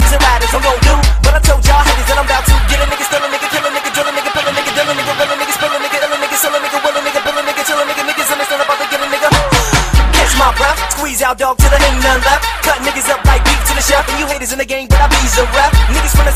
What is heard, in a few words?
fast rap ringtone